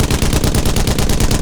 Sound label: 50cal loop; WAR